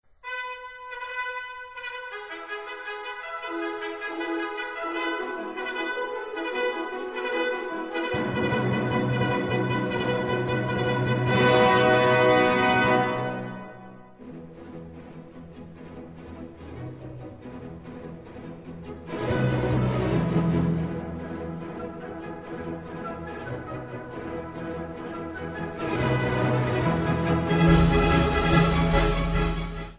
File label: ringtone; 競馬 ファンファーレ